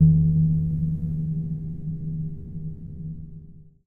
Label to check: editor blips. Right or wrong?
Right